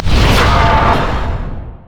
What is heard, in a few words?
slash; LoL; tryndamere